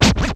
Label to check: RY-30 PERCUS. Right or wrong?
Right